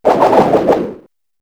spin attack; swordsman

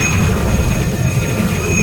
titan laser loop; ror

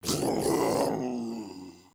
zombie grunt; zombies

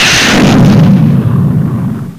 explosion charge, weapons

